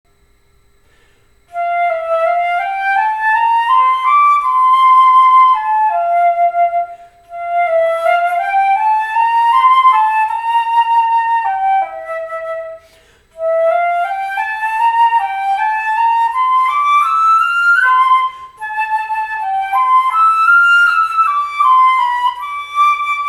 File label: Flute Andante; 8th grade all state